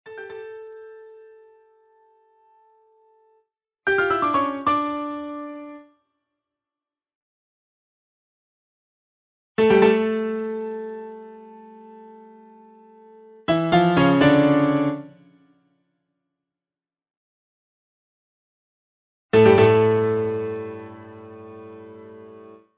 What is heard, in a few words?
Toccata and Fugue in D minor; Busoni